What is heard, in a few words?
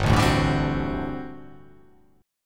G Major 7th Suspended 2nd Suspended 4th G down